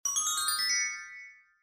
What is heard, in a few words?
Magic Wand